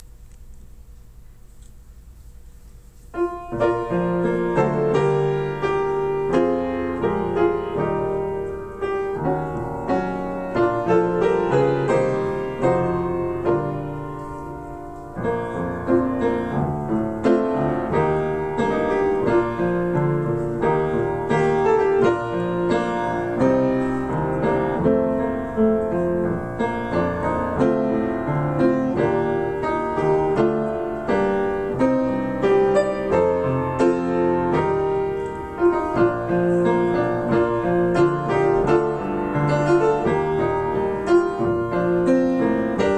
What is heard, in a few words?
Piano; The Long Leaf Pine; 2026 teacher workshop